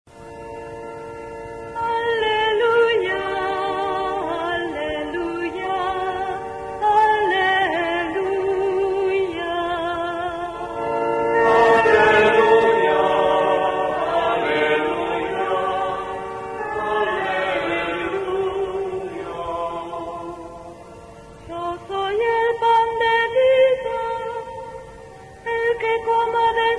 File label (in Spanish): Aleluia